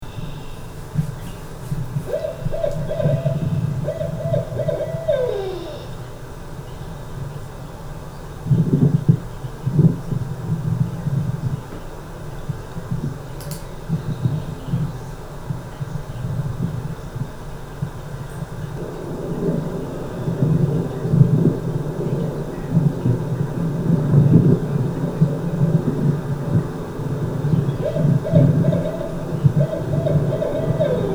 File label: Barred Owl